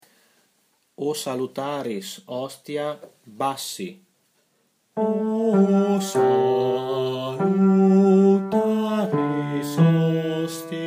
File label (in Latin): O Salutaris Hostia Bassi